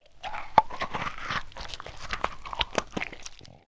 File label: GLIBBER; mahlendur